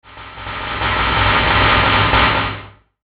Stutter Static Electric Clank, Stutter Descend Swell; Blastwave; Production Element Imaging Elements Accent Transition